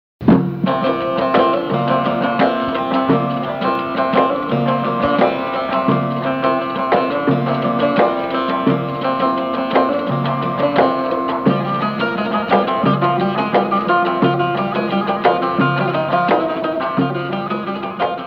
Ciftetellisi - Chypre (Turquie)

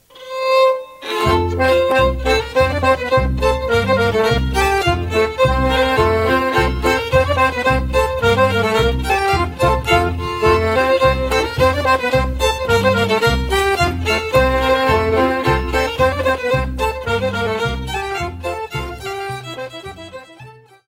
Polka - Danemark; SOMMERNATSPOLKA

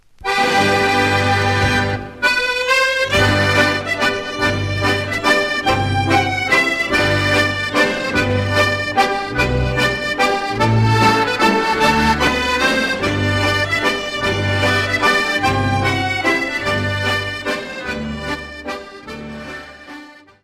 Waltz Country Danse - Ecosse

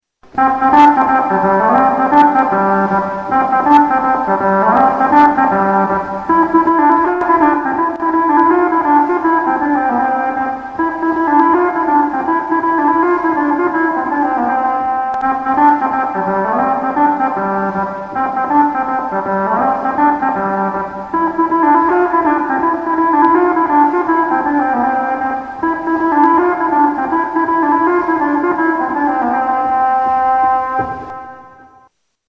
instruments cromorne

